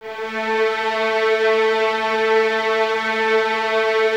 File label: Violins A 3, String Ensembles; Light; Miroslav Vitous; 11 Violins